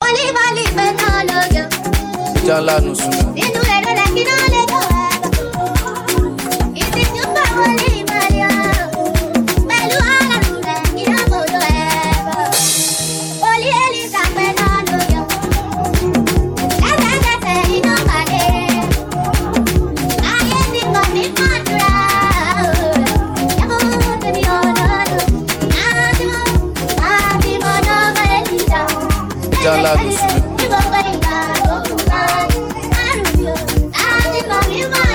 Afro, Afro beautiful and lovely remix

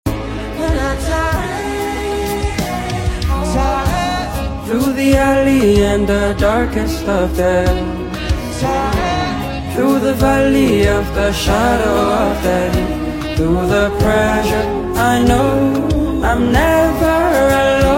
Gospel Cover sped up

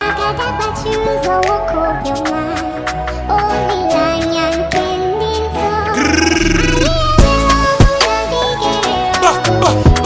DRILL

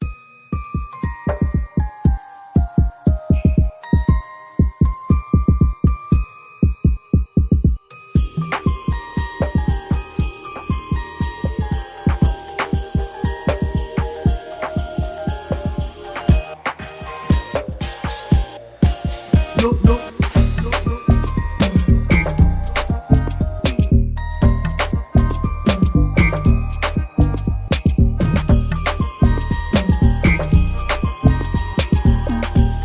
piano

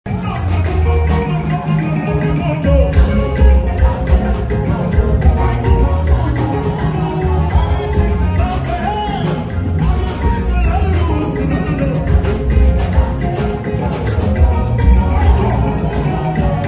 Gospel

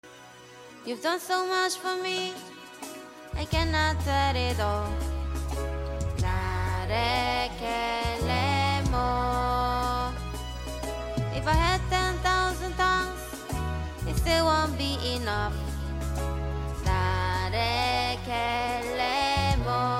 Silly Music

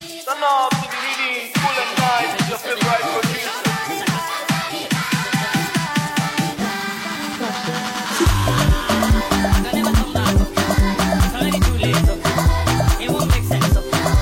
speed up version

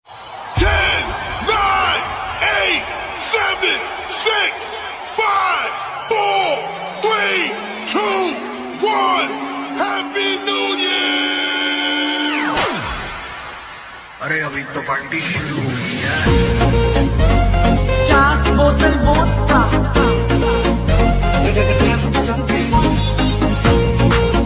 Happy New Year Remix

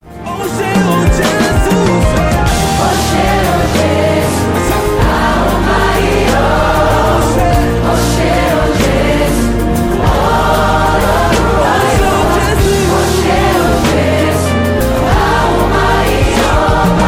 Gospel Choir

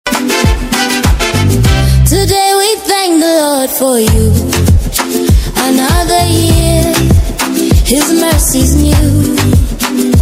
Happy Birthday with Blessings

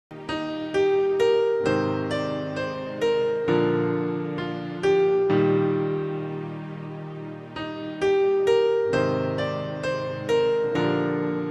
instrumental

